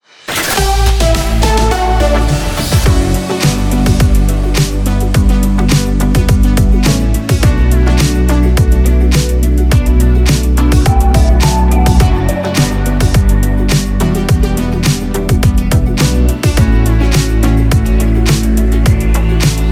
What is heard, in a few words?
Theme Medium; Radio Hamburg